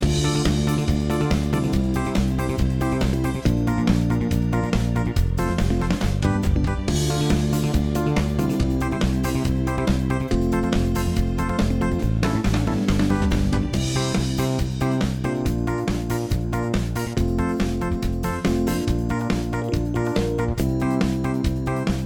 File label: Disco; MU SPKR Disco 01